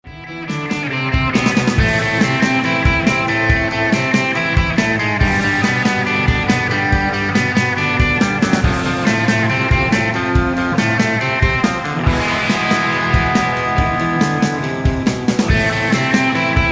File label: horror